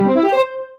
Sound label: sound level up